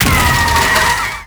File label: Bike Damage